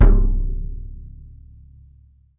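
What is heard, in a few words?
RESONATING KICK; 3D Drums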